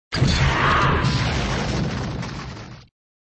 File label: Sound Explosion, flipper; Explosion v2